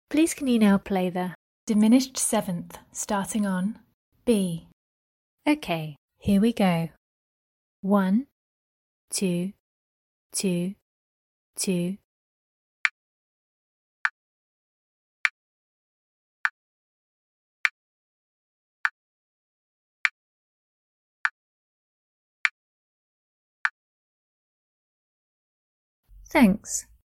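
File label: diminished 7th starting B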